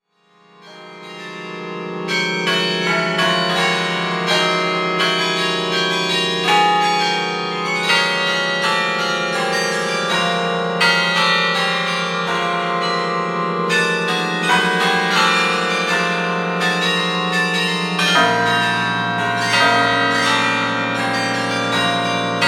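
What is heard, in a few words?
carillon; Swedish Rhapsody; Midsummer Vigil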